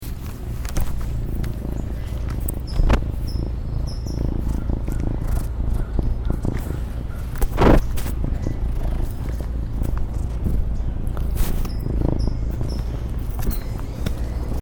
serval purr sound; animal sounds

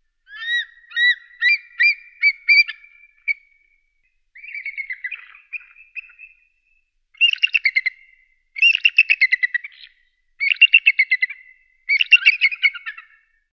bald eagle; animal sounds

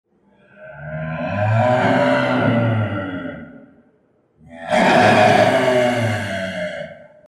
clouded leopard; animal sounds

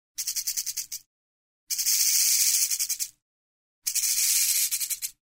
Mojave rattlesnake; animal sounds

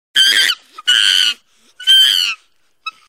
chimpanzee; animal sounds

